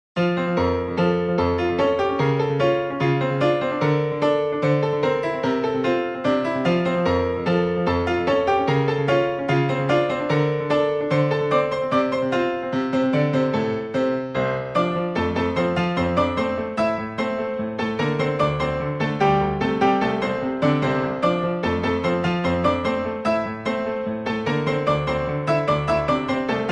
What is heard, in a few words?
piano version